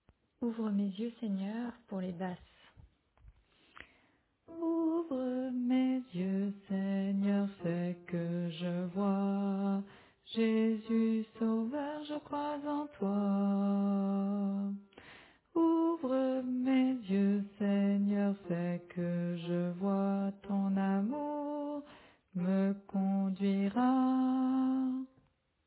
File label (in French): Basse